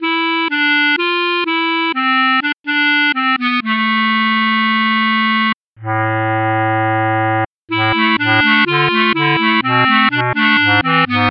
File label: clarinet